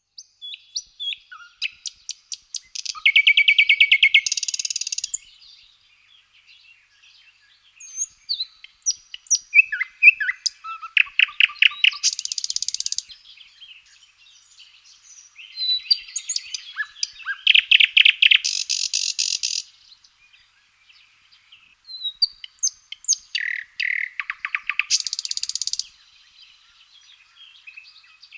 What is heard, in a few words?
thrush nightingale; animals